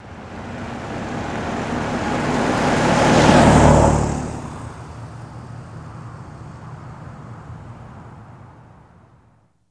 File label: Car Compact Med By